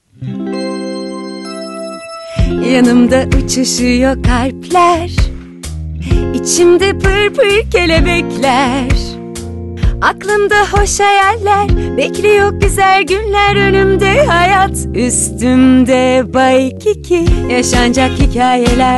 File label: jingle; lc waikiki